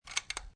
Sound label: 44 Suppressed Pistol Load; War; VRSonic